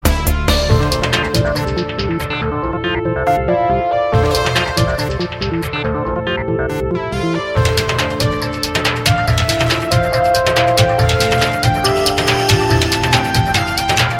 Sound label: romantic music